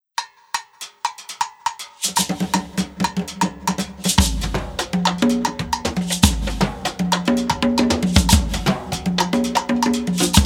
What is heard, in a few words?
Percussion Conversation